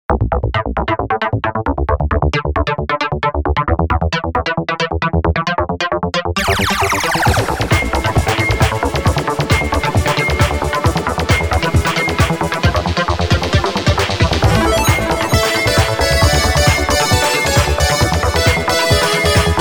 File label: shooting